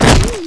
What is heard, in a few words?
fall; Alien Arena; megaman